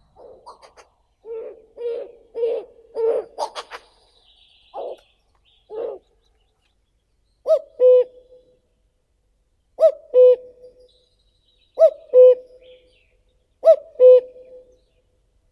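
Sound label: common cuckoo, Cuculus canorus; kukushka obiknovennaya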